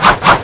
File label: knife slash; Turbulent